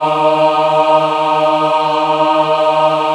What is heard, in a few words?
D#3 MW AH, Choir; USB Soundscan vol.28; Acoustic & Synth; AKAI